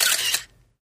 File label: 35mm Camera Auto Win